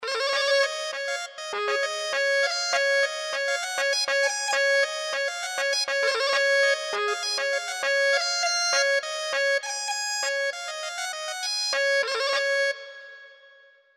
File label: pepa